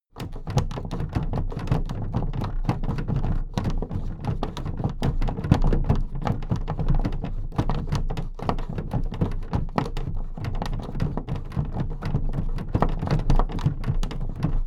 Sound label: big plastic case movement handle fast rumble